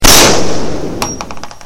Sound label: casing